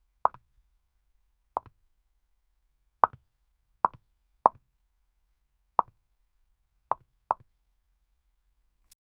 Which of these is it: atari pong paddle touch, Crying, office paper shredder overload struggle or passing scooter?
atari pong paddle touch